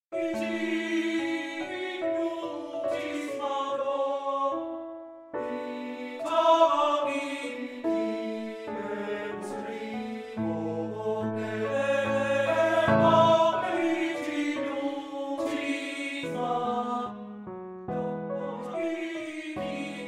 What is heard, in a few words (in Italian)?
Lied per Canto e Pianoforte, Andante Affettuoso; Schmittbaur; Die Eremitage